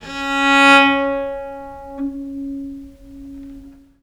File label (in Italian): C#3, Historical Instruments, VIOLE MUT; USB Soundscan vol.23; AKAI